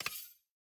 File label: laser land